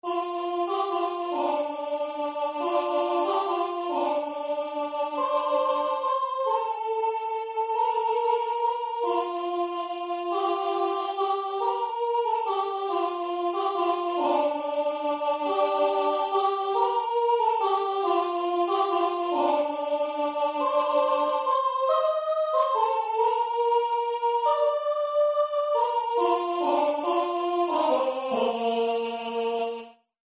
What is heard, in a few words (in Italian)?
stille nacht soprani; Silent night